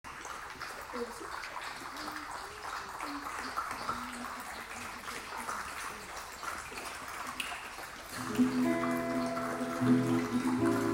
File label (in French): chant portugais